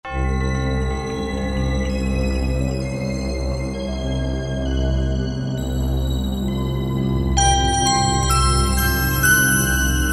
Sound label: 12 Tone Belz, Korg N364; Factory Combi demos